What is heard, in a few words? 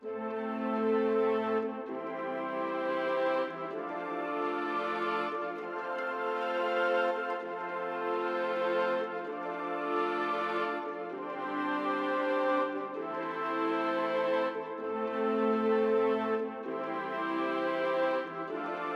music interactive; World of Tanks